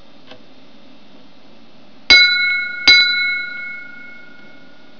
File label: Ding; OpenBVE; Train; Orange